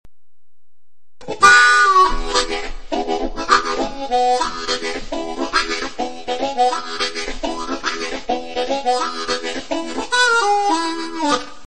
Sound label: chords, harmonica